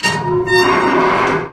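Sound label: safety gate close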